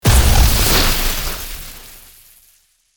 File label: Spell Impact; rpg; magic